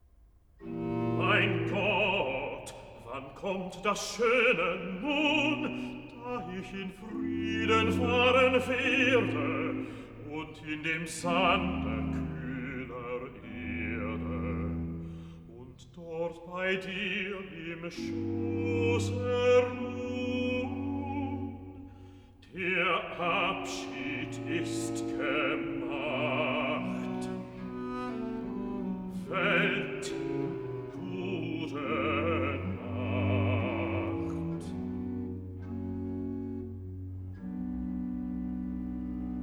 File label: Recitative (bass)